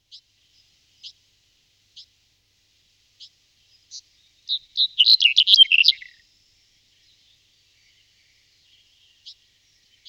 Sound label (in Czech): Strnad cernohlavy Emberiza melanocephala